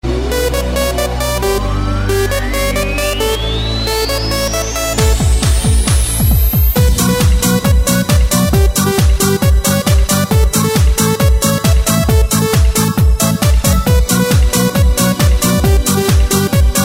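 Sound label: dance club music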